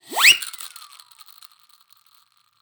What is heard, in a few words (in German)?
TROETE, percussion; Session Instruments; Best Service ProSamples vol.42